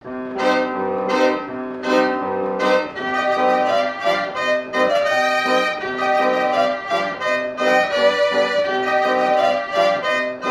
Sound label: hupfeld model b electric; HUPFELD MODEL B PHONOLISZT-VIOLINA